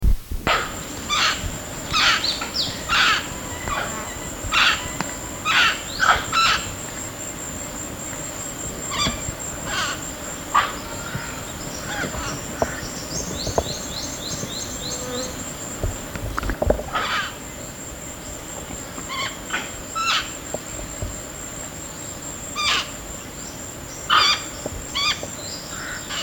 blue naped parrot

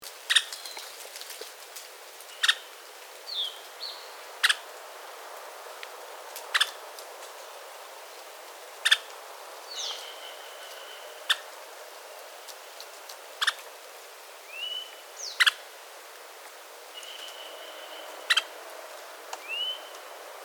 scarlet backed woodpecker

